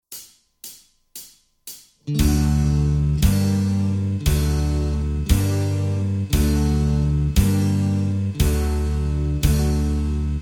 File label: (D) No Lead Guitar